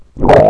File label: gurp